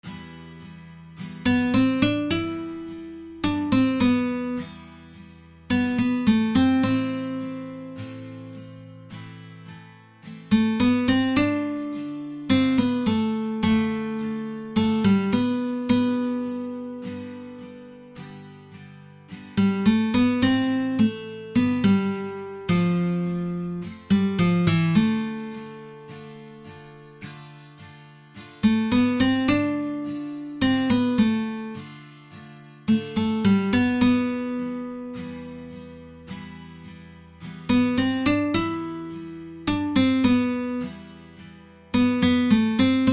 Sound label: guitar